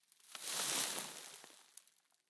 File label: sand basket; ambient; dust2